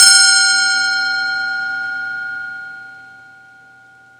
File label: bowl